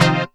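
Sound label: dance chord; E-MU Formula 4000 Series Vol. 2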